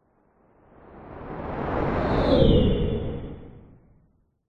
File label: science fiction spacecraft medium sized electric fly by whistle hum fast; zapsplat